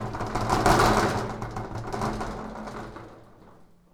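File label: metal rattle, crank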